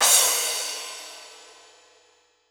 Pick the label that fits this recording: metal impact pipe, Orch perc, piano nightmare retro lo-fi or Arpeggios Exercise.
Orch perc